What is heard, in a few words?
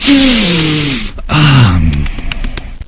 sniff; svencoop